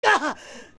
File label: Fall Down; Link getting knocked to the ground; Adult Link